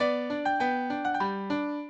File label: minuet, mozart